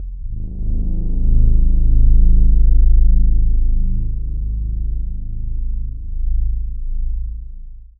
Steampipe Ghostwhistle G 3

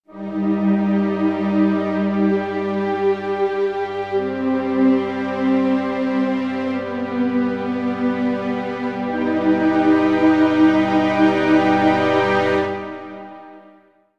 Slow Dramatic Orchestral Intro With Choir

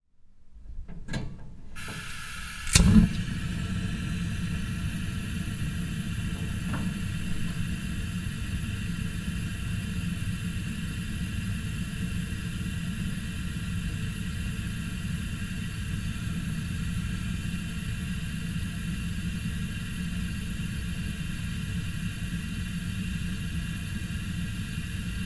Gas Oven

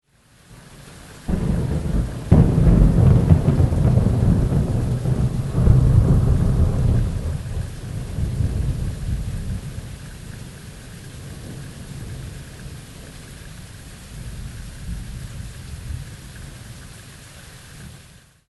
Big Thunder Boom

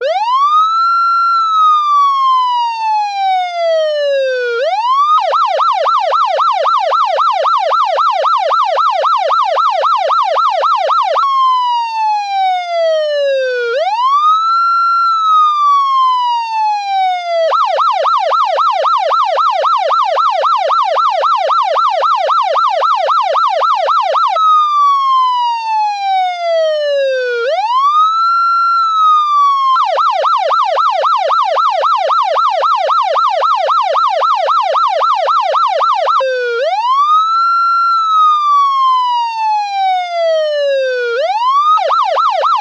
Police Car Siren Synthesized Dry

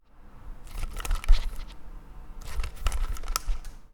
Putting Headphones On

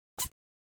Cat Sneeze

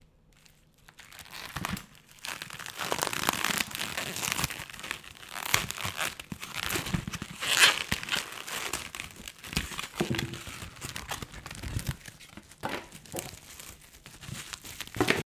Ripping Plastic

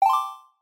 Ui Triangle Sytrus Arpegio

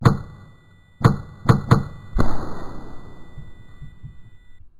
135 Bpm Loop

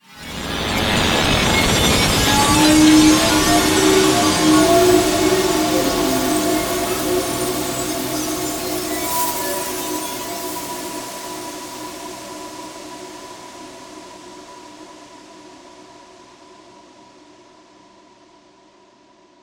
Horror Hit

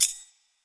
sword

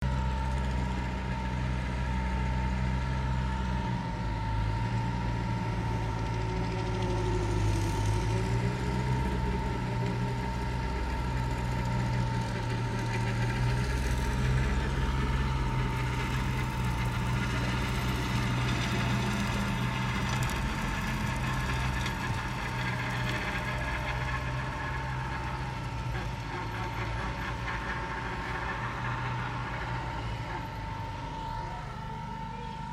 Tractor

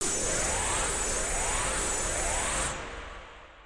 Laser Power Charge Loop Pitch Up

